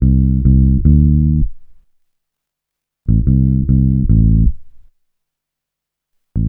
GREEN 74 C, Bass Grooves; USB Soundscan vol.30; AKAI

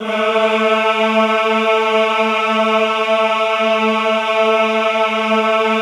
EHH A2, Classical Choir; Best Service; Extended Classical Choir; EHH MIX

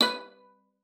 B4, VIOLA FINGER; Xsample VOL-5

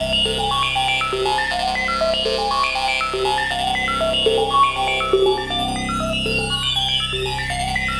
Computer Lead, Synth; Synth SFX; Sonic Foundry (Sony Creative Software); The Electronic Music Manuscript Collection

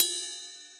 Ride; Equipped Music; Loungin House

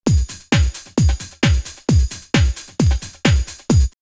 Ride Rhythm 132, drumloops, Techno&House; X-Static Goldmine

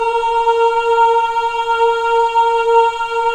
men "ah", choir of ahs; Roland - LA Composer vol. 1

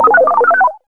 SCI FI; X-Static Goldmine 1

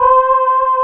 ANA VOX C4; ANA SYN; SAMPLE WAVE MIXING 1

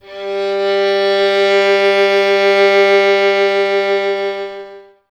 violin; Miroslav Vitous; Solo Instruments VOL-2; Solo lnst. II

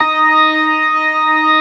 STOPS, organ combos

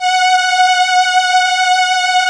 vocoders; Sound & Vision - Gigapack I CD 2

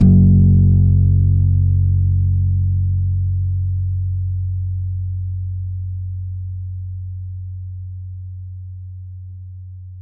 acoustic bass f#1; PS-52 WORLD INSTR 2; STRINGED INSTRUMENTS; PS VESTER ACOUSTIC BASS

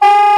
multi, soprano sax; Northstar - New Gold VOL-1

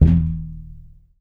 TUB BASS E 1

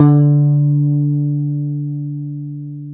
WAL BASS; AKAI S6000 CD-ROM - Ultimate

